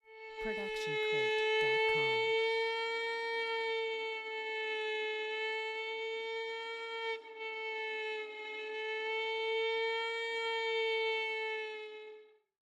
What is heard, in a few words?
Violin Bends Gliss